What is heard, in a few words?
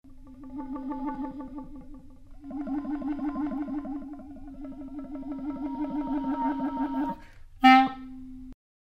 Clarinet